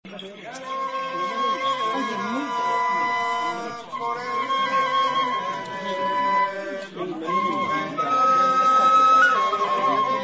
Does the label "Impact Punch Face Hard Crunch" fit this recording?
No